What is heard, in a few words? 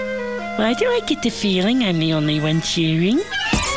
cheer